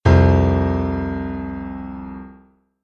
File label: Piano Interval; Example Interval: Perfect Fourth